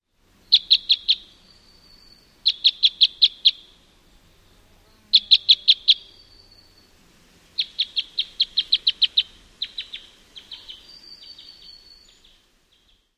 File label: calls; NY